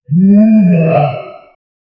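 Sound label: tribal jungle boss incoming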